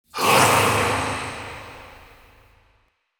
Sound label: Processed Battle Shouts, Viking Vocal